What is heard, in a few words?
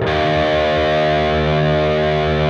PRS E, PRS LEAD, Guitars